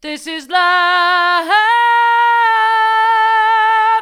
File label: this is love hard, vocal